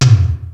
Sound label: DRUMS; Rubber Chicken Software Sample Bank